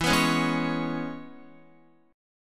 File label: E 6th, down, Guitar; strummed